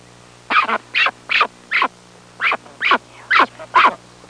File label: Scared goose sound effect